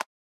Catch; Boomerang Fu

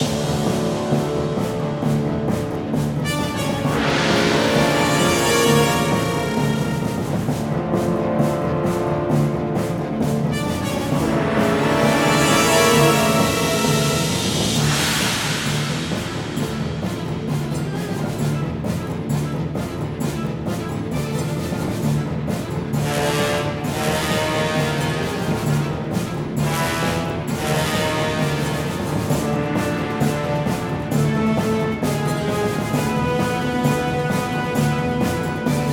music, batcave mid action

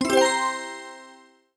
Correct

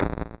Enemy snd Die; Diamond Hollow

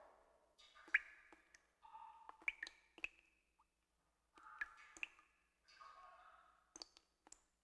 Ambient Shower; Among Us

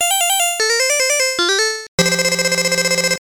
Break Time ~ Return Home Music; Pac-Land; X68000

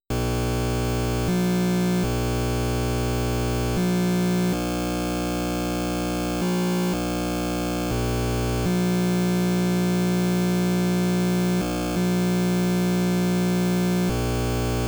My Car Run, Empty, Slowdown

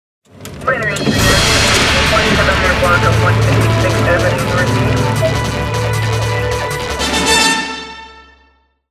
Fire jingle

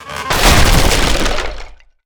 Smash House Break Apart; Lucius II: The Prophecy

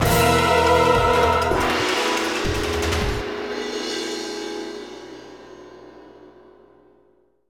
Action END; bolt 2008 pc gamerip

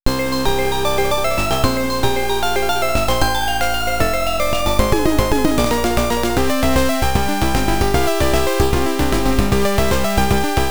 Fly High; Nemesis; MSX

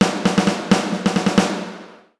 Rocket Zoom; Lego My Style Kindergarden macOS Windows 2000

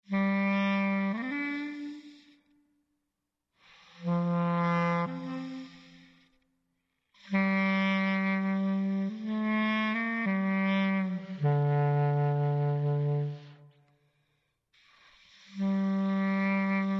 Dialog; the walking dead season one

